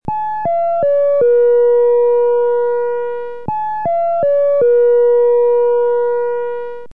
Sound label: Quadruple Chime; signals